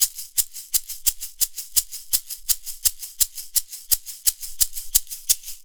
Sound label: R&B Shaker; R&B Funk Soul; Digidesign; Pro Tools; Pro Tools Essential Demos; Essential Loop Demos; Demo Session